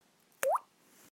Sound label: water drop